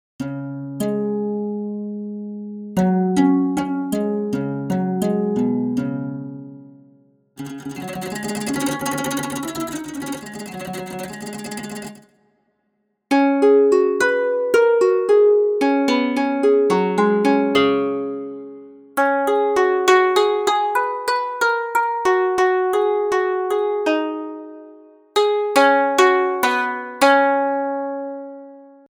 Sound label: Koto